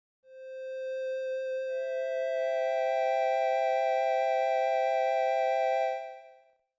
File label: C64 Triad; stay a while